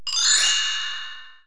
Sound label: kling